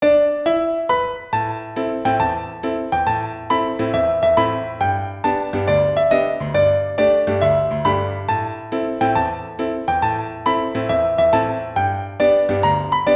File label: piano days; どこか懐かしい古い日々を彷彿させるピアノのメロディー。; ループ